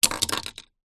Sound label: leisure toy car metal down on surface; hot wheels